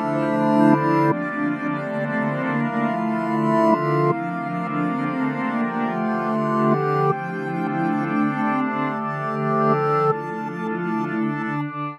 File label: synth keys 160